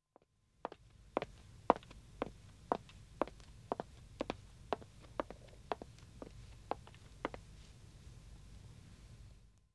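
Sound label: Wood (Dead Acc) Walking 1 woman; Footsteps; BBC Sound Effects Library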